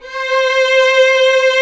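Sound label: strings, 8pc; String Master Series; Vlns 2-5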